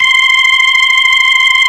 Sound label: B3 LO DIST, Vintage Combo; E-MU Formula 4000 Series Vol. 3 – Analog Odyssey